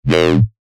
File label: Bass Shot E; synth shots tech house; C4nn4bis; revealed